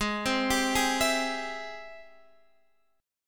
G sharp Augmented Major 7th G sharp Guitar Standard; G#+M7 arpeggiated; x,11,10,12,9,12; JGuitar